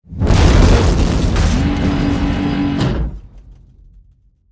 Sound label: tank spawn; Original TK Sounds